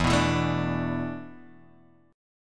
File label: E 7th Suspended 4th E down Guitar Half Step Down